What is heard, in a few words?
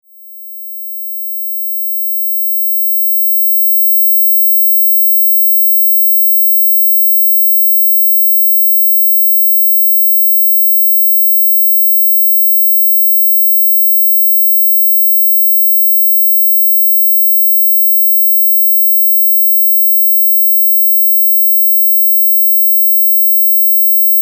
mosquito stealth 17000hz